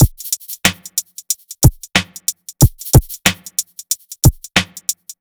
BEAT, Hip-Hop & R&B; USB Soundscan vol.47; AKAI; HIHHOP

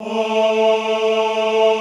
OHH A2, Classical Choir; Best Service; Extended Classical Choir

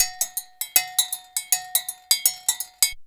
bulu grooves; Best Service; World Colours

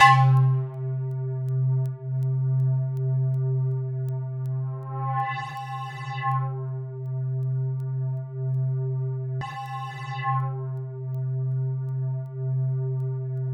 C2 FM, synth line; 90 s Sample CDs; NiceBeats; SynthLine VOL-20

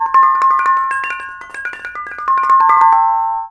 KONGWONG

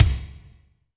Kik Blaster, Alesis D4, Drum Machines

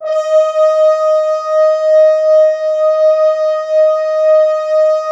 Orchestral Brass

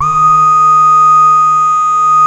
beginloop, synth; Keith Emerson Signature Series; BEGINNING

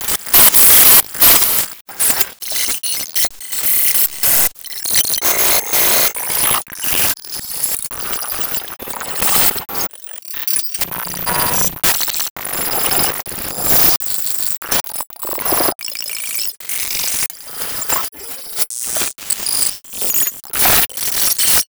Glitch

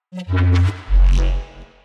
neuro, bass

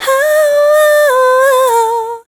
female dance vocals

